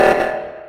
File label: reactor meltdown; among us